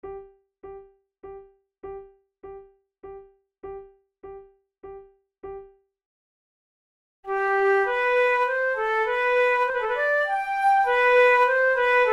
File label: duet